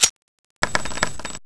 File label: clip out; brainbread